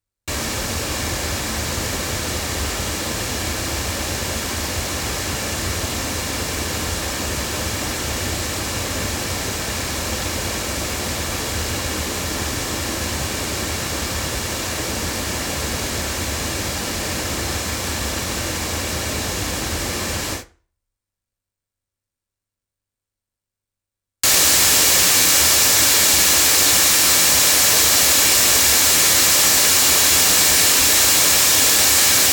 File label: C5000 loudspeakers; JJLabs